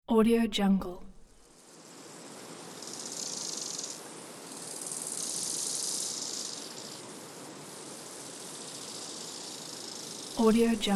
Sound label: summer crickets